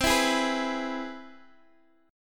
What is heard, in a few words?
C Augmented Major 7th, Guitar Half Step Down, chord